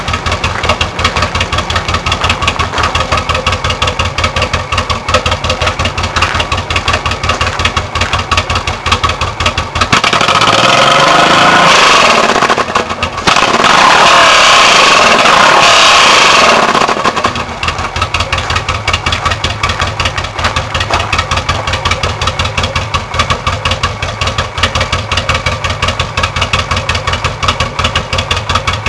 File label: VTX1800C 89-112db, exhaust